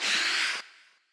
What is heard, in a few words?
paper